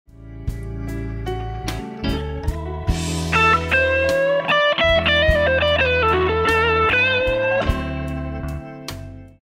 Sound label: Blues Lick, guitar; blues method licks and solo; Blues Guitar Method 1